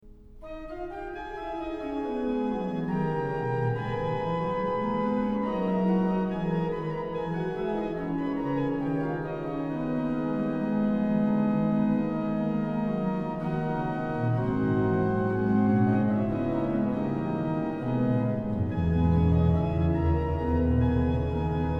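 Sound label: Wer nur den lieben Gott lässt walten, organ; BWV0690; Kirnberger; BACH; BACH ORGAN WORKS; CHORALE PRELUDES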